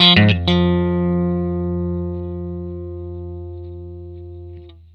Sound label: blues guitar riff F, Vintage Blues Guitar; USB Soundscan vol.22; AKAI